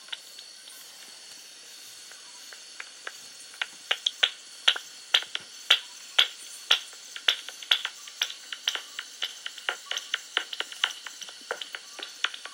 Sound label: echolocating bats